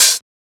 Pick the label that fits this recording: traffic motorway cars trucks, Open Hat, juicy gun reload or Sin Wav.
Open Hat